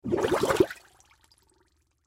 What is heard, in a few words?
Fart Bathtub Bubble, Water Fart Bathtub Bubble Surface Shallow Gurgle; Blastwave